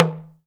TALK DRM, Heart of Africa; Spectrasonics

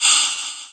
Fx Vox; Equipped Music; Loungin House

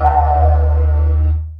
Didgeridoo Kit Samples

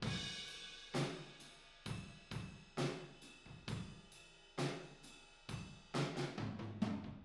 Chorus, Room, Drums; Sonic Foundry (Sony Creative Software); Drums from the Big Room The Mixes; Demo Projects

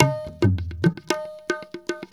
INDIA L; Spectrasonics - Heart of Asia CD2

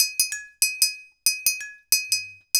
Vibrottles

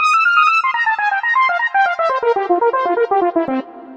Analog Brass Riff, Keyboards

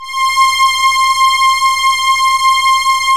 CHORUS STRI; E-MU Formula 4000 Series Vol. 2

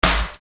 Percussion, EuroDance

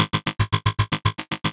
DANCE FX

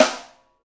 St Snare, Roland BK-5; Snares

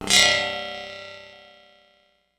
Trans Cymbal, Ensoniq ASR-X Pro

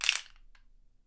RATTLE; Miroslav Vitous - Percussion & Harp

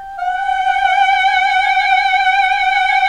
MMH G3, CLASSIC VOX

